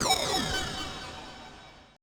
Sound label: AMBI FX